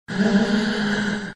gasp; cave

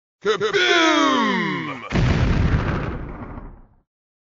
kaboom; cave

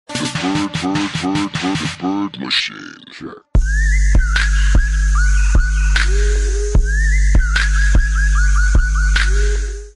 bird machine; reversevape

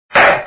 gavel; reversevape

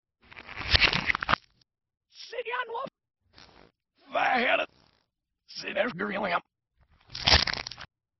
wiggles; reverse

